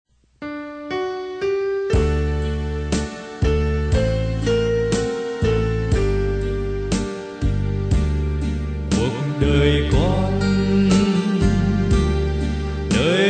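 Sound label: soprano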